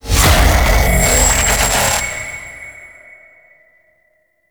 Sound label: Necramech Enemy Activation Vocal; Warframe; Entrati; Nechramech Hack